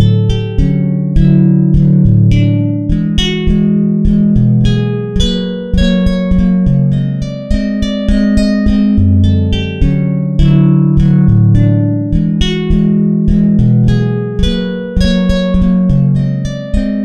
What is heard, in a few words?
guitar loop; Mode-2; modelonia; guitar dry loop